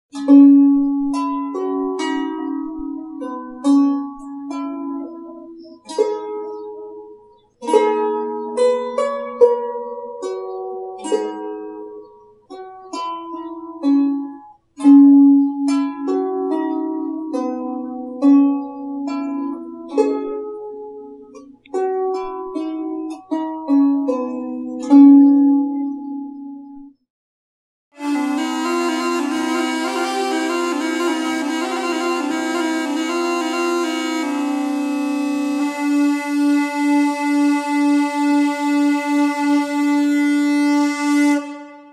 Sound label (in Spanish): Instrumentos musicales. lira aulous